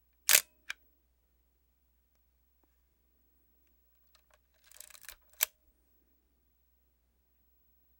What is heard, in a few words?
Camera 35mm Slr Minolta Xe 7 Single Shot And Advance Film Shutter Clicks